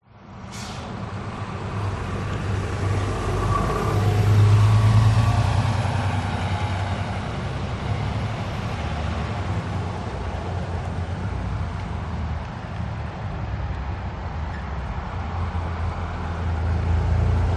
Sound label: traffic cars buses trucks pass by intersection usa aspen colorado